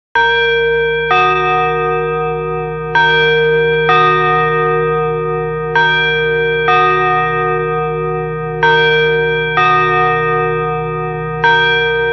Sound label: chime; denon